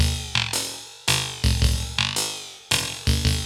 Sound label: LOOP, TECHNO; TECHNO-BOX; ELEC-LOOPS